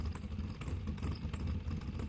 static engine; furious road

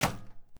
arrow; save the monsters

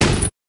gunshot; war zone

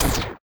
bomb sound; blocks up

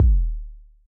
kick; dunk shot2

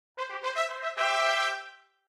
new high score; stack jump

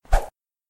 throw; street ball jam

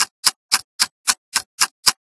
timer loop; water the village

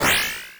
boost; air wolves

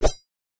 join in; sling driver

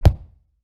ingredient; breakfast time

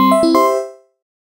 win; ball puzzle2

